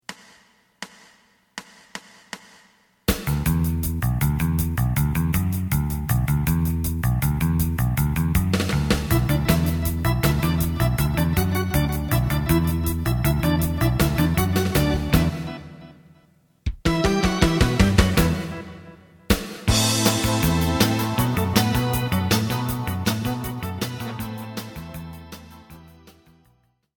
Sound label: lead